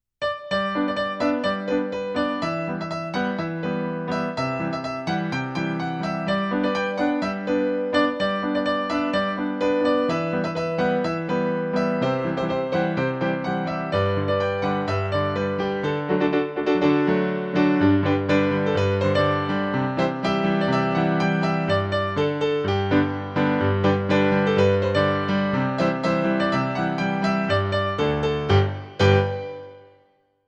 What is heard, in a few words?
Irish music piano solo